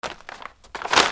PAPER

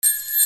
BELLS

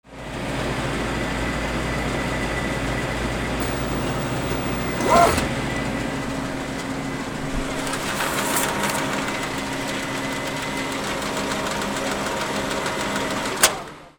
OFFICE

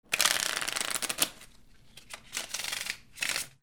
CASINO

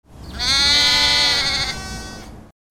ANIMALS

